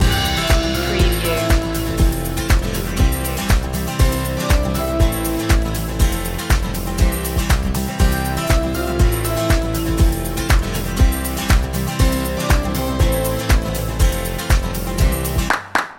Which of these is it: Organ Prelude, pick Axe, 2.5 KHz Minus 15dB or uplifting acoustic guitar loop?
uplifting acoustic guitar loop